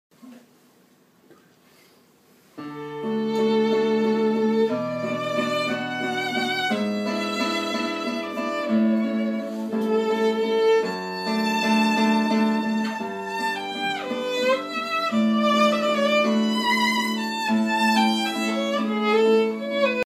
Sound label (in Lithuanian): smuiko music